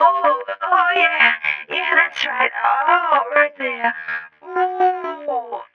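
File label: sexy distort, Vocal Loops, 125 bpm; Transmission-X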